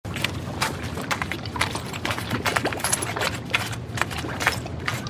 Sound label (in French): secoue; MOBICLIC174